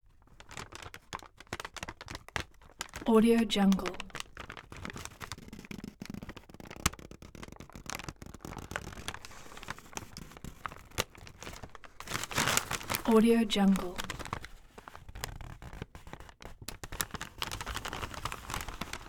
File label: paper bag handle